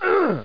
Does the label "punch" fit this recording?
No